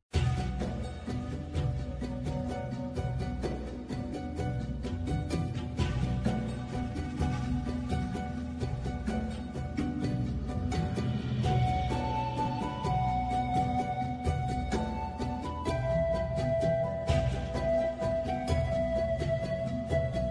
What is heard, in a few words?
free game bg